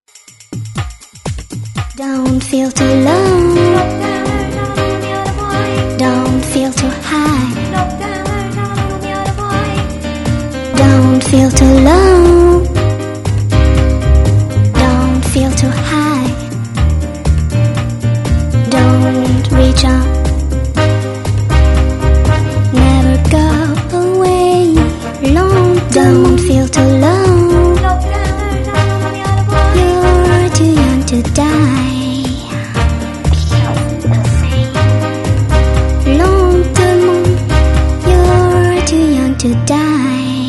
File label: three chords